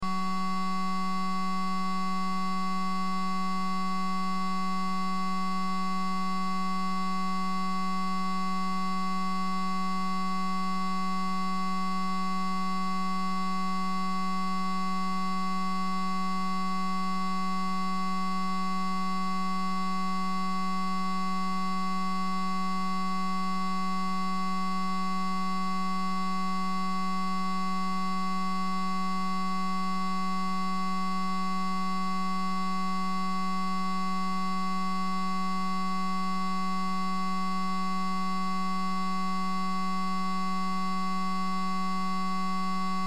Electrical Panel Hum Ambience Electrical Buzz; Factories; Industry; Blastwave

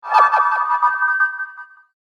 Magical Presence; Magic Sound Effects; fantasy sound effect; Andrew Fleming

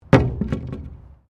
Wood On Wood

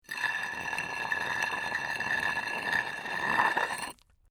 Stone Drag Rock Grind; A rock, cynder, brick or stone slab drags along an even rock surface; Rock Sound Effects

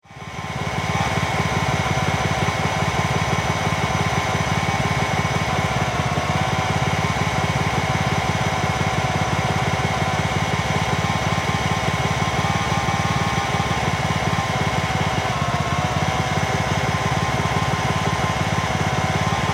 Lawn Mower Idle, Engine; John, Deer; Lawn Mowers

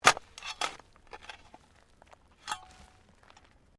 Digging Shovel Mulch

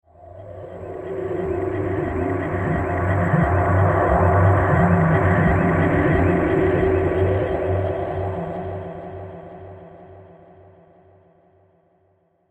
Alien Planet Surface; Alien Sound Effects; science fiction sound effect; Andrew Fleming

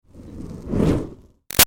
Fire Torch Whoosh Med Light; Flames; Sound Effects:Objects; Big Room Sound

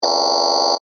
Scanner Beam Ray, Scifi

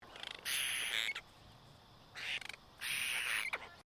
Bird Apostle Bird Call; Various Apostle birds; Animals

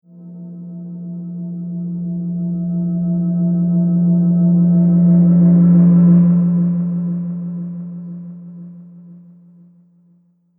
Fading Ghost Whoosh; sound design; Whoosh Sound Effects

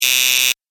Tv Show Buzz In; electronic

